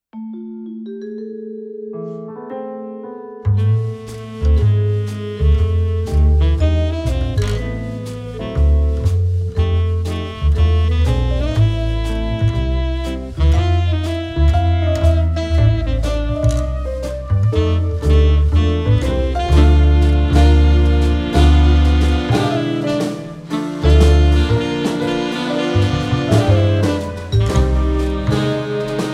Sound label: Fullmix no Chimes